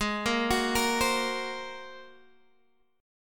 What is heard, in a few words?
A sharp 7th Suspended 2nd Sharp 5th